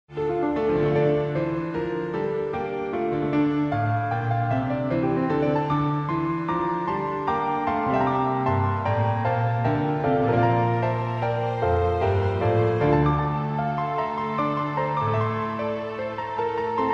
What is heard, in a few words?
Piano Conc 2nd movt